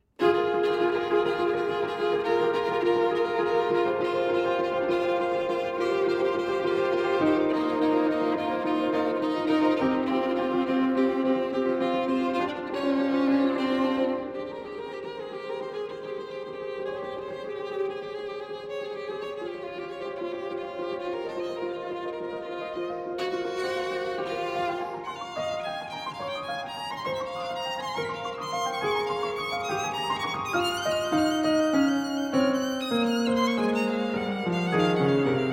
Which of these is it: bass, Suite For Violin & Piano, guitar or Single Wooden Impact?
Suite For Violin & Piano